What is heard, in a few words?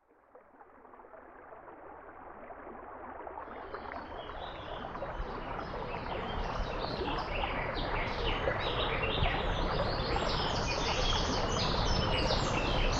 morning nature; Apotheorasis